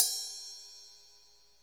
Real Closed Hats

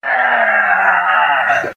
growl, Voice

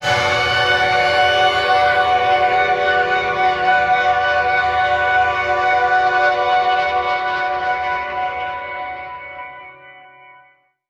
long electric fanfare note

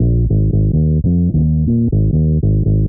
bass; Phonky

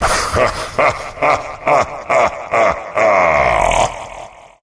laugh

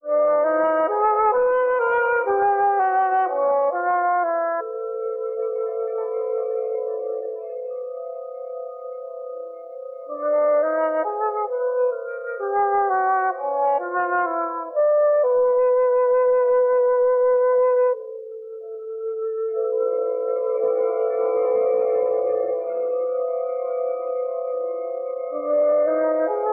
flute, Sad

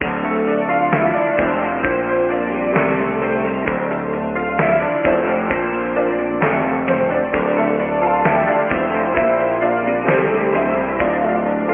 keys

